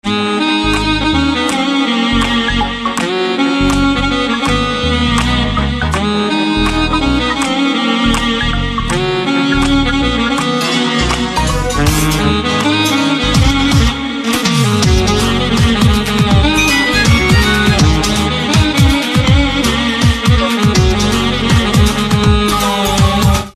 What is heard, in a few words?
Saxophone Ringtone